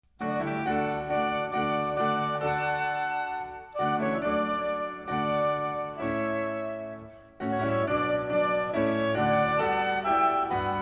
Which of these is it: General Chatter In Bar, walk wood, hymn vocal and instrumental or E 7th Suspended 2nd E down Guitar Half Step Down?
hymn vocal and instrumental